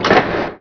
small door open; SpecOps2 MPlayer Demo

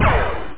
plasma rifle; alienbreed3d2

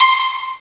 Pizzicato C6; Star Trek JR Audio

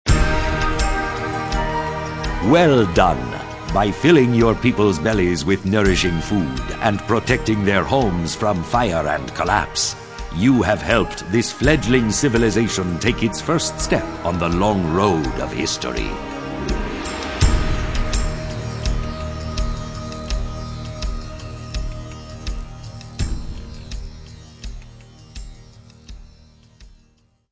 victory; pharaon

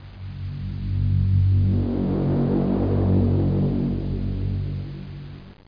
Hum

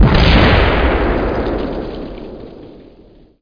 medium explosion; SpecOps2MPlayerDemo

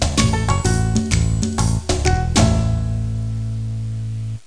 mus end

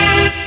synetsa, techno stabs; Terra Sound Library

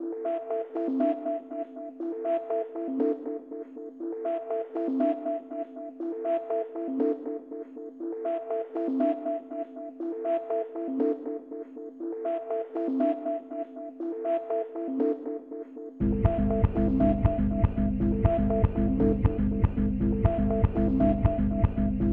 Fight Music

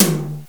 Room Tom; GMPlay; XG

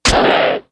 gazelle die; Immortal

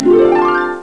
GLISS. Sound; Pushdown Machine 2.3

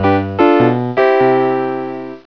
baccarat openup; Boxcars Casino

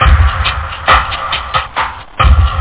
jungle; breakpointz loop

